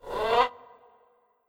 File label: Ara Parrot; Ara Parrot V O C1